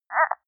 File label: frog call croak